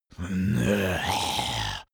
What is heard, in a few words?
zombie moan idle throat